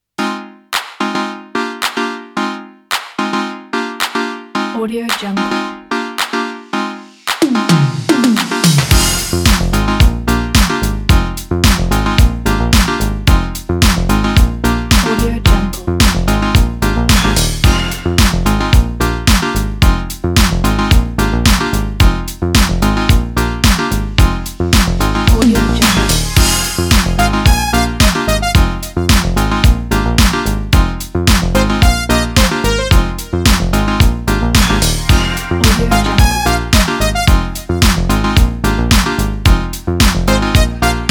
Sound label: funky disco 80s